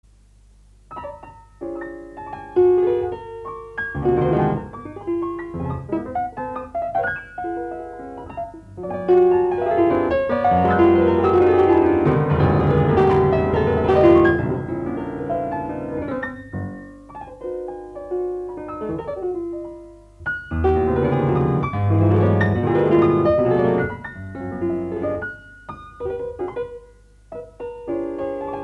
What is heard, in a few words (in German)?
klavierstuecke